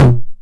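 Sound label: 909 mid tom tape saturation; Goldbaby Tape808 & Tape909; The Tape 909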